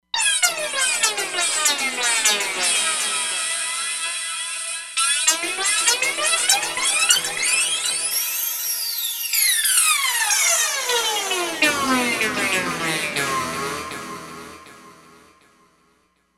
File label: Flazzy Lead, Roland Fantom XR